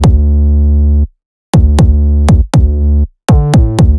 808 und kick gleich; Der ultimative 808 Producing Guide

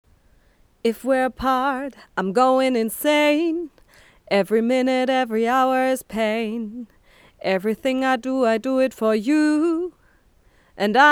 beyerdynamic mc 930 gesang f

